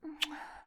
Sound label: female kiss